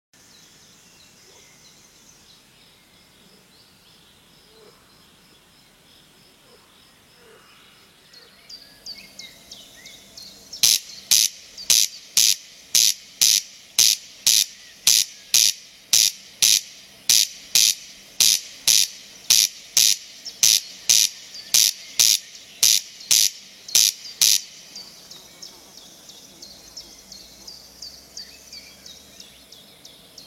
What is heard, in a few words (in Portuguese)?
Canto do codornizao africano